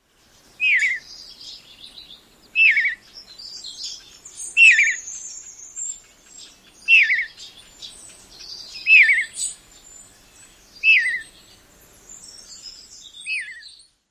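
ROBRGR tee yoo calls immature, rose-breasted grosbeak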